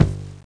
Button; Mutant Dungeon VR

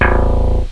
bass guitar; Sound Effects for Multimedia - Volume 1

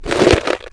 bod strk, bugs; Starship Troopers

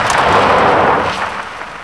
SKID; mtm2demo; PC Gamer 4.2

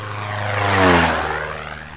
plane; bigtop

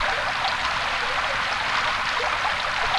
Rain Loop softer; Triazzle 1.0 Demo

